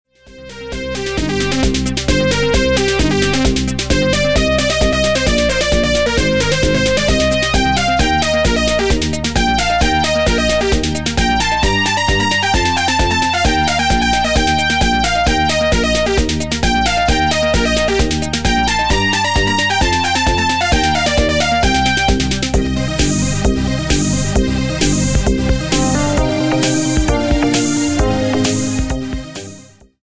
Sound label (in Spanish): ritmo